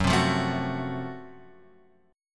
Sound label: Gb Minor Major 7th Flat 5th, Guitar, Open F, chord; strummed; x,1,0,0,0,1; JGuitar